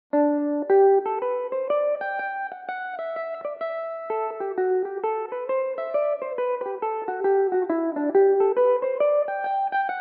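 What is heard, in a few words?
G major, mandolin; Gan Ainm 1; Belmuse EM-190 electric mandolin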